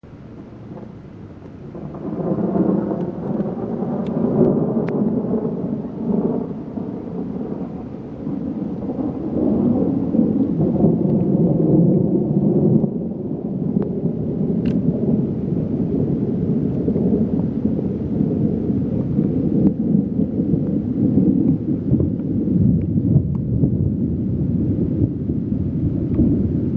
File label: thunder